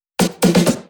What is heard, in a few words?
Fill 128 BPM; Vengeance Samples; VEH1 Loops